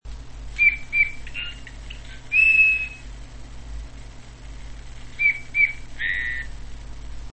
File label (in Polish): gil - piosenka